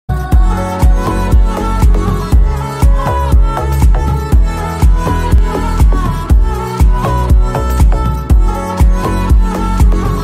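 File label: background music ringtone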